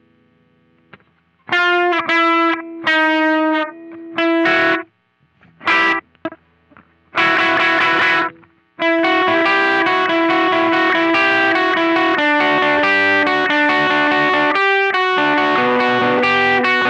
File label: LP Riff Slow